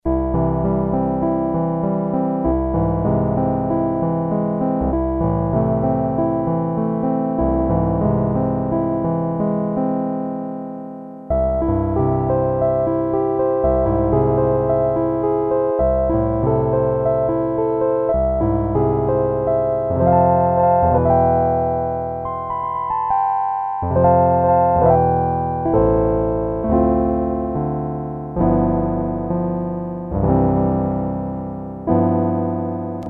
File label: piano, Technics SX-K450 Poly Presets